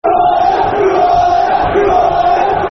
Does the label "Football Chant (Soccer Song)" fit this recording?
Yes